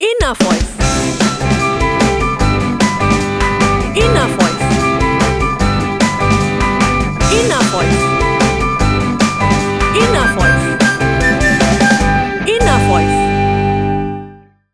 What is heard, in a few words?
cooking time tv intro